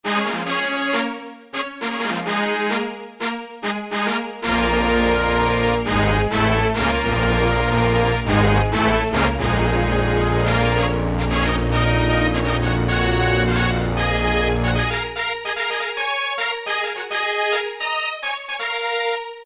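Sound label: 5th Brass + Strings, Roland U-110; Factory Patch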